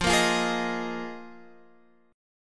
D sharp Suspended 2nd Suspended 4th F

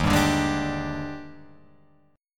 Eb Major 11th, Guitar, Open F; EbM11; strummed; JGuitar

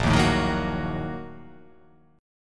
A sharp Major 7th Suspended 4th Sharp 5th

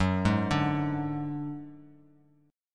F sharp Suspended 2nd Sharp 5th, Bass Standard; arpeggiated; JGuitar

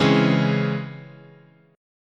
Db Minor Major 7th, guitar chord

